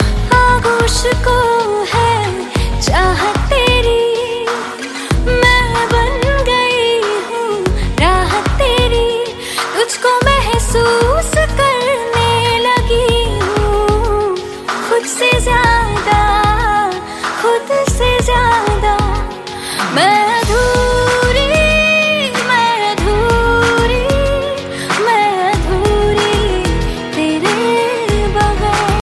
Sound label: romantic female voice hindi ringtone, bollywood